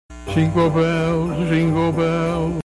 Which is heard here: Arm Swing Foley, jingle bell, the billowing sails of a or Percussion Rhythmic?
jingle bell